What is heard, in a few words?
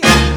JAZZ HIT; E-MU Formula 4000 Series Vol. 1; Hip Hop Nation